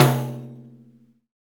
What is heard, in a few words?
Pandiero, Tambourines